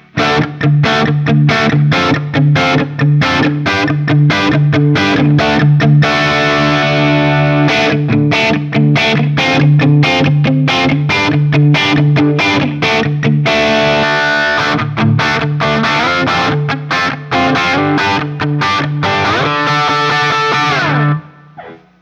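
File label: Guild Bluesbird 90 Antiquities JCM800 D, guitar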